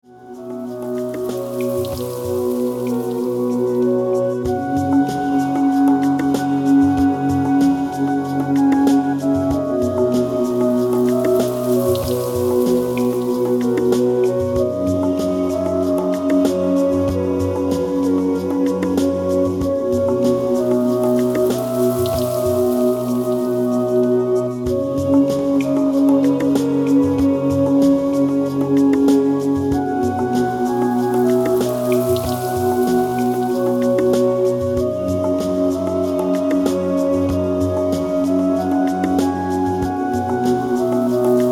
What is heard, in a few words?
Spa Music Relax